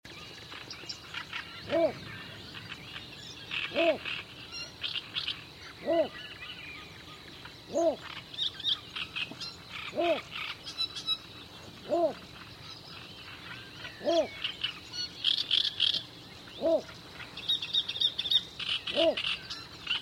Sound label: Bittern Sound